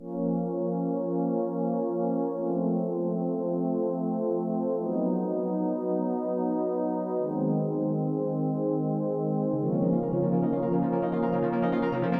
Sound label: synthy sorta thing